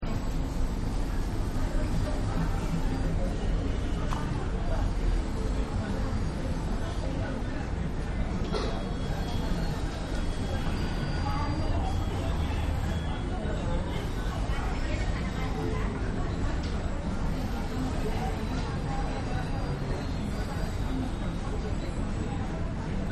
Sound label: India Indoor Market; Cities; Backgrounds; City